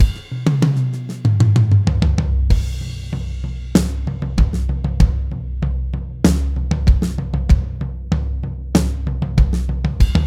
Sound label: Basic Tama